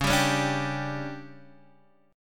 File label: D Major 7th Suspended 4th, chord